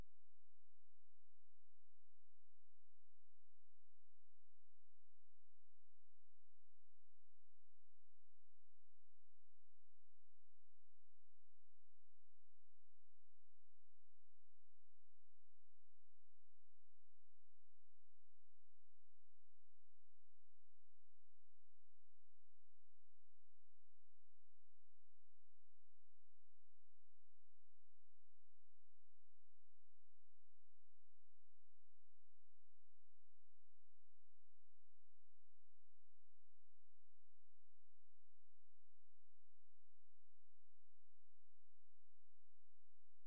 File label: Toms Mix, Drums